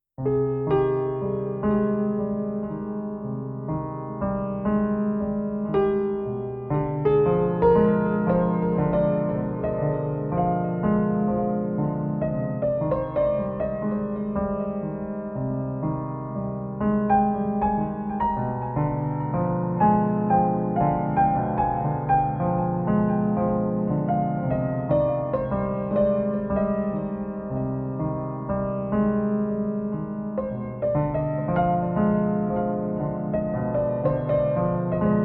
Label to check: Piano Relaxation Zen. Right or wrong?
Right